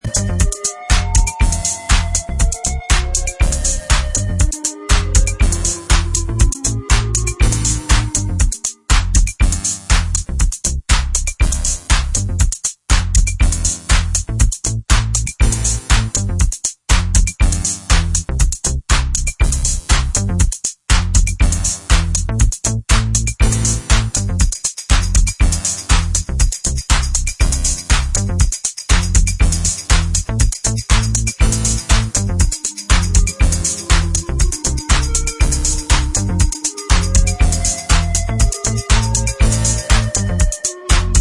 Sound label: dsi flutes